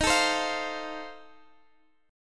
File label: F Major, D sharp; F/D# strummed; chord shape